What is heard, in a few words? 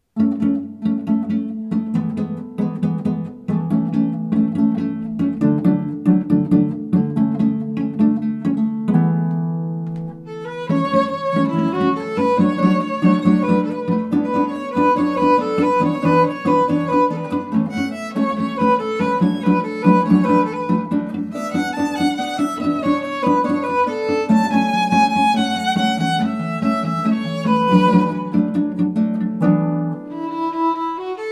with harp